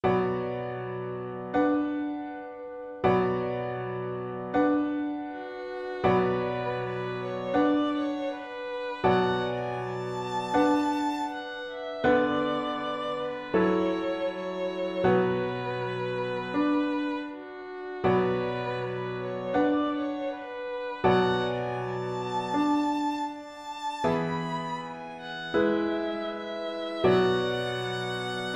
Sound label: Song without words violin; Holst